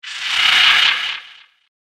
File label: Night Spirits, ghost sound effect; The Passing Darkness; horror